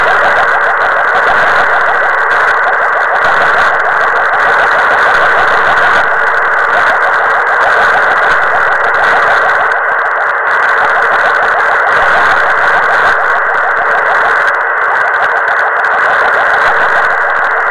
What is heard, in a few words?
milsat warble tone 248 901 mhz